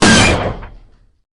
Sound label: harsh impact single shot